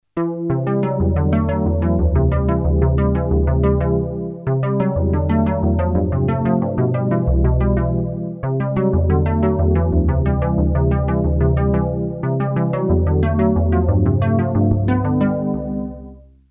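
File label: synth arp, analog polysynth; Solton SM100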